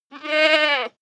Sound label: goat baa male